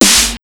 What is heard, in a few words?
JUMP 130 SD FX, Big Beat Drumloops; USB Soundscan vol.25; AKAI